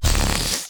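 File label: Balloon deflate reverse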